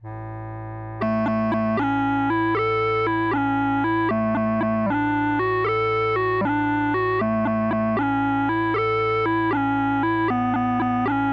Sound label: The Banjo Breakdown